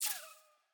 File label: switch activate; cross-code; puzzle